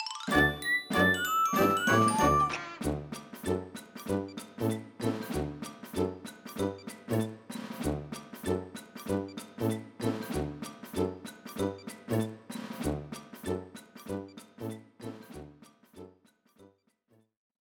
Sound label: Boot sound; Pig Medal; Wii U